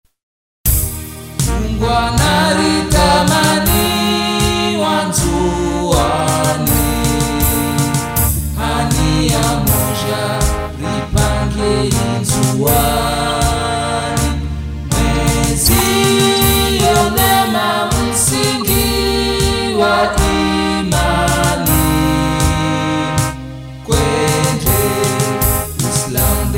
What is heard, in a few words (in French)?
hymne anjouan